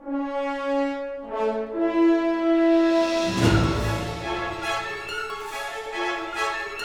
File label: SWING INTRO; Spider-Man 2; PS2